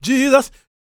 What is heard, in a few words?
GOSP MALE, Vocal Planet Gospel; Spectrasonics; Vocal Planet Vol.1 Gospel; akai